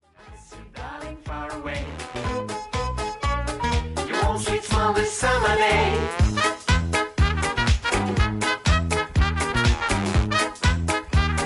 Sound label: electro swing jazzy rework